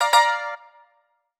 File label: alert; Walkman Fam 3 Wise alert; Sony Ericsson; PlusFourSix; SE Sound Project